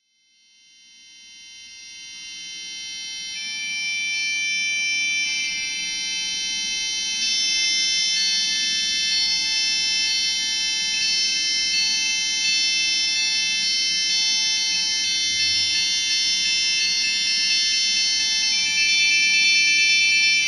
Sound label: dial tones; telesymphony